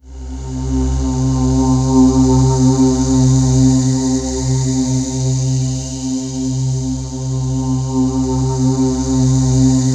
C4, Synth Leads

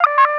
COMPUTER, Synth FX Misc

